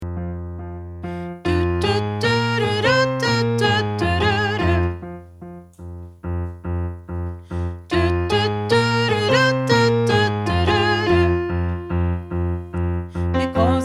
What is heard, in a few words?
SOPRAN